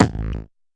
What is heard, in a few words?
tight hit, Tight n Hard Kit; Hip Hop; STYLE KITS (Hip Hop, Etc)